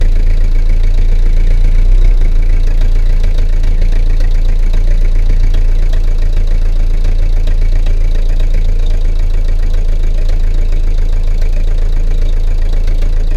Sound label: Buick LeSabre idle, automobile; 1970